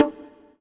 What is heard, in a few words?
Roland SC-88 Low Bongo